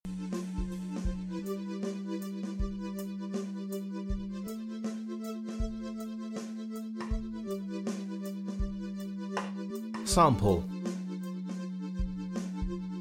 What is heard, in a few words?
Dreamy Groove